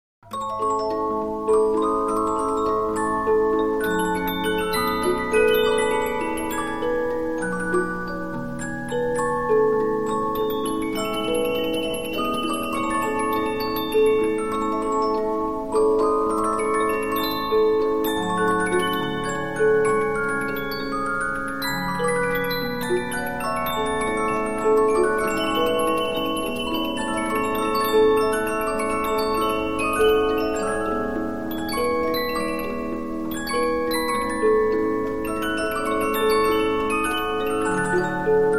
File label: Polyphon, 15inch disc musicbox